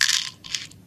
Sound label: pills; Barotrauma; Neurotrauma